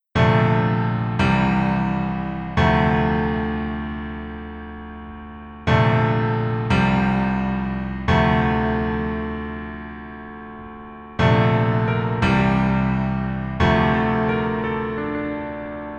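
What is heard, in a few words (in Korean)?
Piano version 멜로디 MR 남자키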